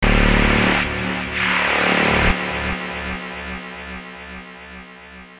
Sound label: noise